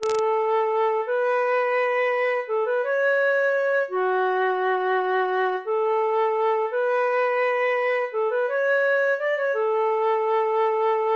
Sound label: flute, MIDI; kyoukai no kanata